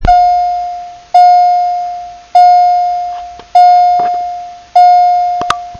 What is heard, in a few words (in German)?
Lichtwarn Ding Dong; Lichtwarnsummer